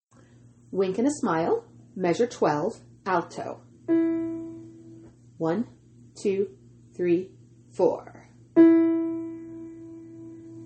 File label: alto